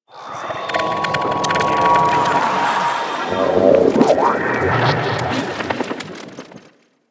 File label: Excavation Scanner Random; Missions